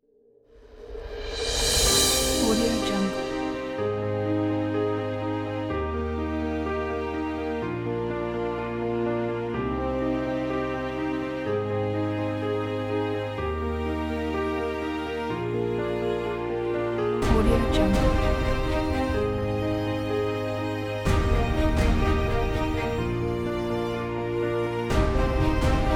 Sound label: cinematic trailer; whaleproject